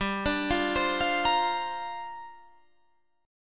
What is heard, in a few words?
C 7th Suspended 2nd Suspended 4th, G